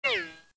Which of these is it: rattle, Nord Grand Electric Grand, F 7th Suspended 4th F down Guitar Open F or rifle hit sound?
rifle hit sound